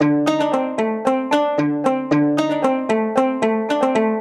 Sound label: Evocative Lyre - Dmin 114 bpm; MIDI Clips; Tonal; Motifs; Ableton; Factory Packs; Mood Reel